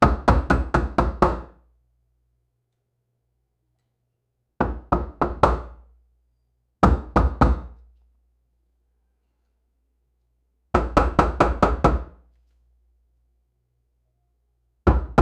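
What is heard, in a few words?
loud knocking on front door; interaction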